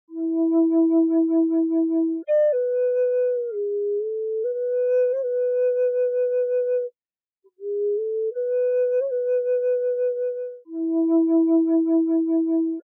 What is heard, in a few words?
Curly Maple Key E, flutes; Long